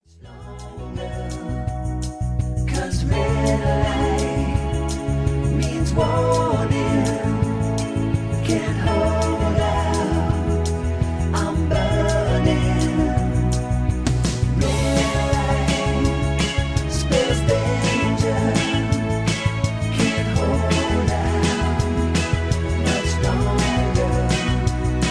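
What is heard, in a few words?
Karaoke Backing Track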